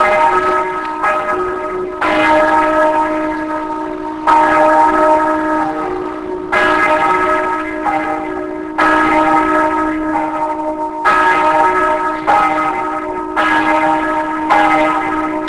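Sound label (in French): cloche angelus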